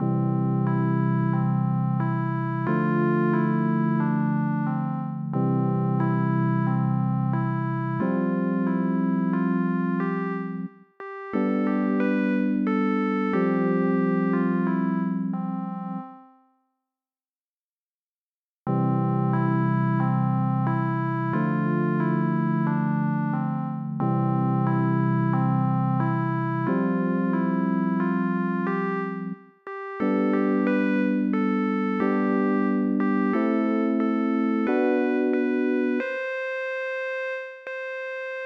OB-XD

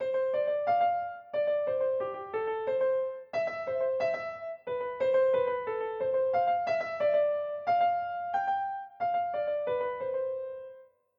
Delay

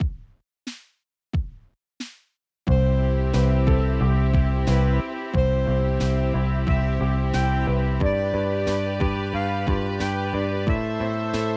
bass

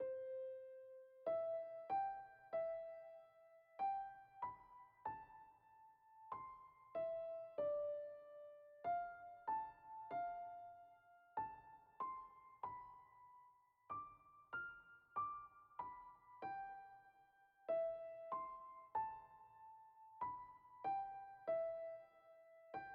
S1 Piano

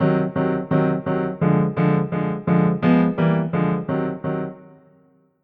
Deminished

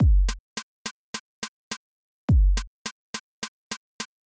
8beet

